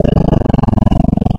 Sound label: zombie dog growl